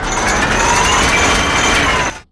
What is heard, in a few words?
m134ex spinup, weapons; superzombie